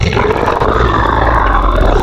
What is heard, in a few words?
Dog, Animals Wavetables; Cymatics; Serum; Animals for Serum - Gold Edition